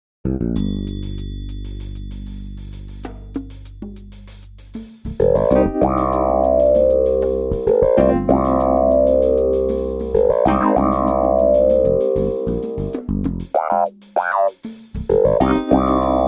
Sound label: Wah Wah Cue